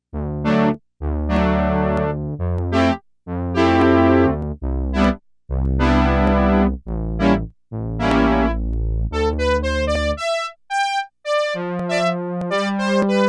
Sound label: Modern Horns